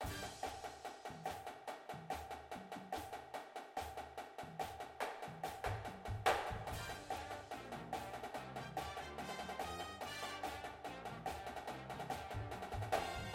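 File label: snare with music, drumline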